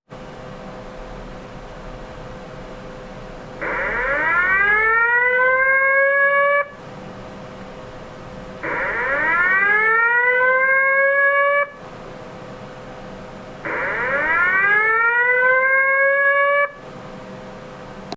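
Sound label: ohtunamikeihou, tsunami warning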